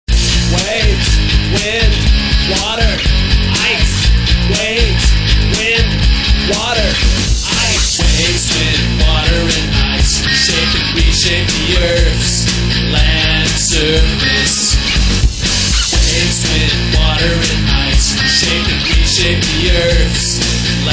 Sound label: waves wind water ice